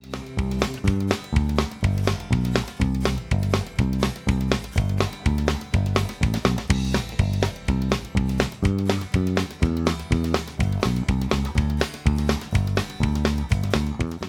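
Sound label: guitar bad; audio examples from the book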